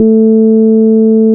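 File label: Juno 106 resonant band pass A1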